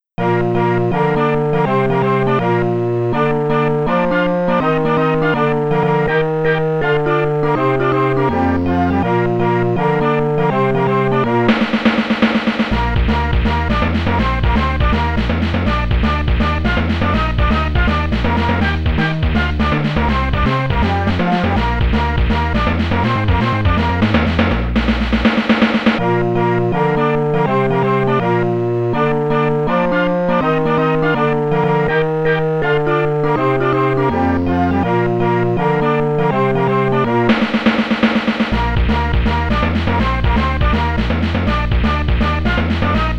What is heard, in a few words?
Ten Green Bottles, Archimedes; Lemmings